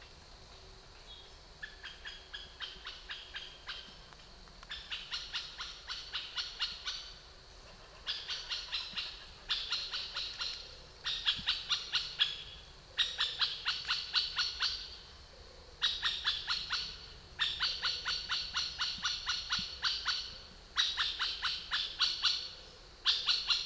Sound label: Middle Spotted Woodpecker - Dendrocoptes medius; Vidutinis Margasis Genys